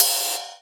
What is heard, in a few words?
Rides, Cymbals